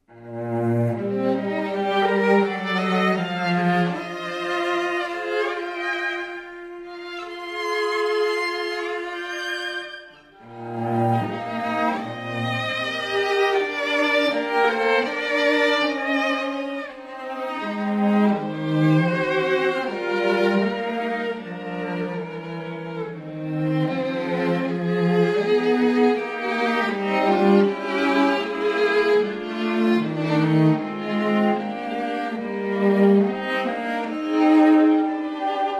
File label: strings, Professional String Ensemble; classical musicians